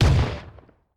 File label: Shotgun